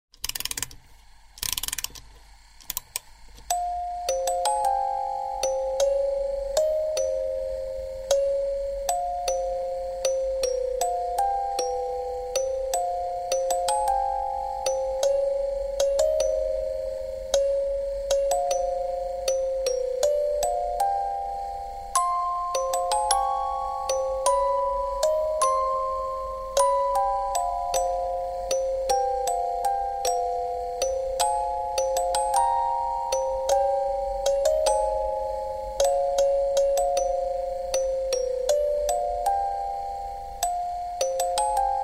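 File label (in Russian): zvuk raboty mehanizma i melodiya, sound of a mechanism working and melody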